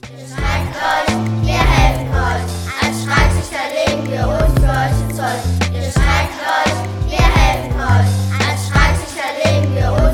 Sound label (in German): Streitschlichter Rap Refrain; GS Im Rosental